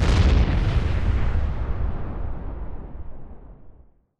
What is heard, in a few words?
weapon fire explo; Titanfall 2